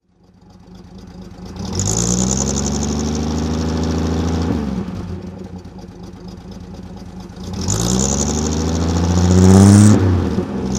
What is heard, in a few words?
delahaye 135M throttle